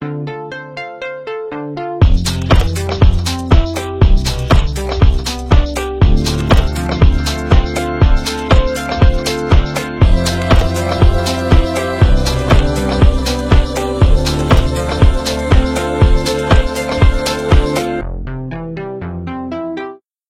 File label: Dance Progressions; Fume Music